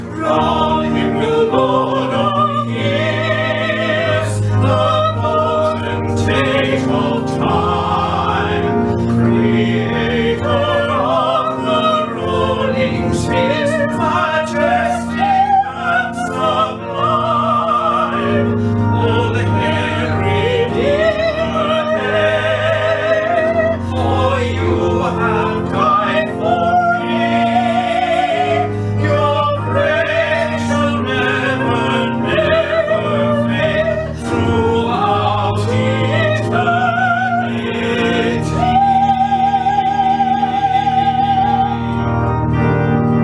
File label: with reharmonisation and descant; Crown him with many crowns; LAST VERSE ONLY